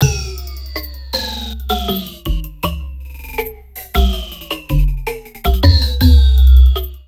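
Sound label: neuro, drum loops crazy processed, 80 bpm; USB Soundscan vol.07; AKAI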